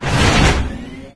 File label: rpg draw, weapons; svencoop